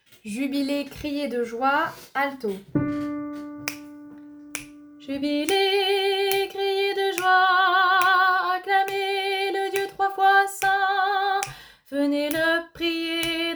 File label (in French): Jubilez criez de joie alto